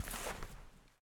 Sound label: step bush